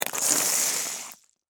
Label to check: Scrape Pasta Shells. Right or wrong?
Right